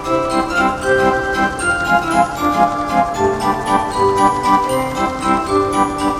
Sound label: Carousel Full; My Tamagotchi Forever; android; GSFX Carousel Full